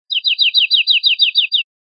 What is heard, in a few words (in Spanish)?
golpes corridos tiotio, verderon